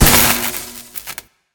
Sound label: passive hit; smite; raijin